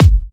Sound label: Bassdrums